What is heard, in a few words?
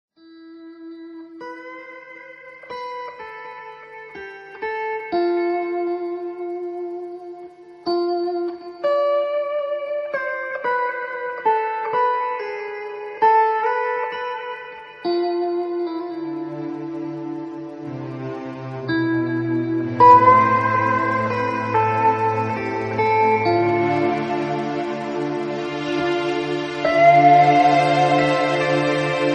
Solo Guitar; Instrumental Rock